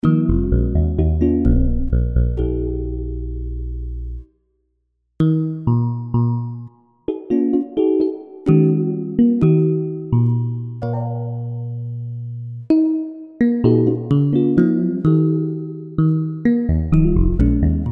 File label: drum